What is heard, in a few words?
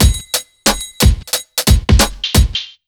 90 BEAT, Pure Electro; USB Soundscan vol.03; AKAI